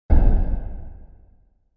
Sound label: Flame; Flame sound for video editing, movements, logos, flash, or animation.; Sound Design